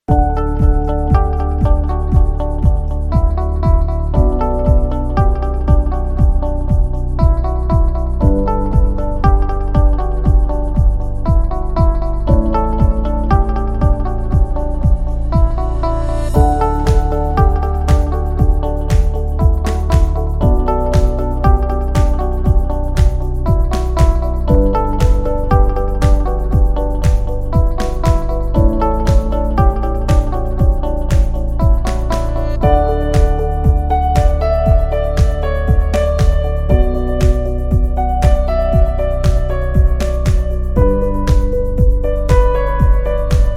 Emotional Calm Elegant; corporate music vol 2

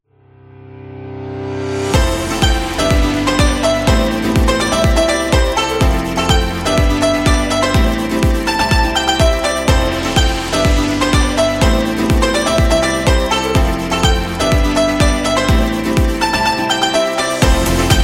Chinese New Year; sounds of the world

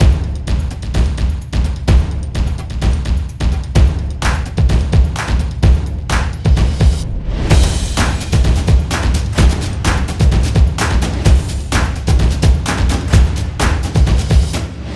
Sport Drums Percussion; percussive nation vol 2

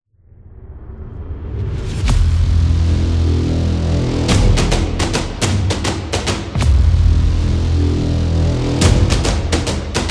Drums Tribal; tribal dance

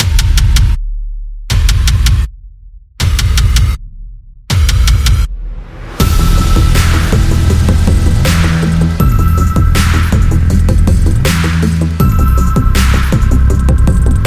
Sports Hip Hop

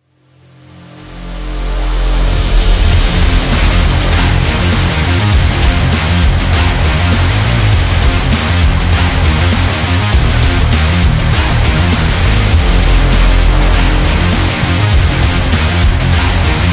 Stadium Rock; electro rock

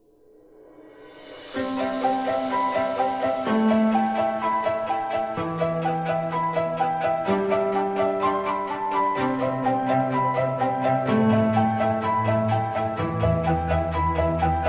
Cinematic Piano and Strings; corporate music vol 4

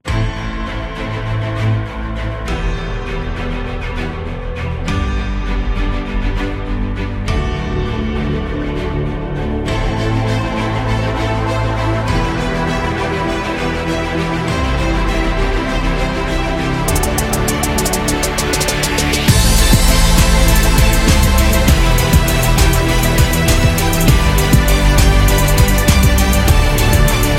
Motivation Beat; corporate promo

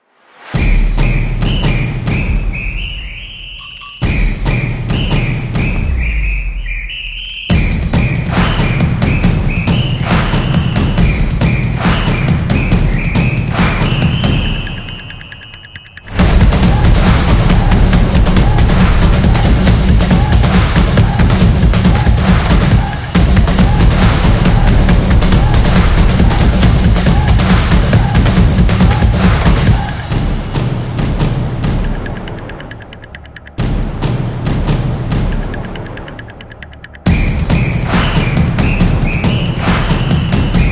The Football Stadium, football music

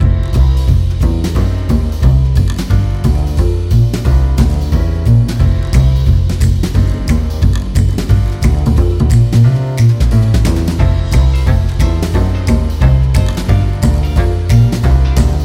This Is Jazz, jazz club

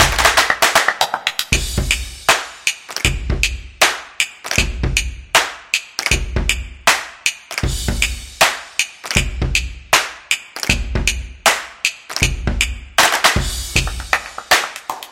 Claps Media; percussive nation vol 1

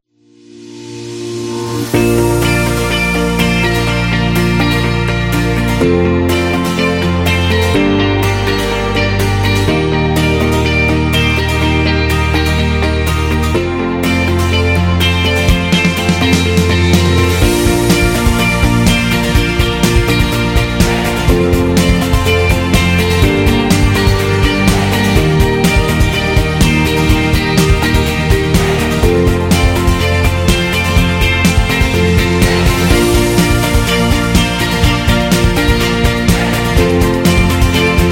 Dynamic Corporate Education, corporate music; corporate music vol 22

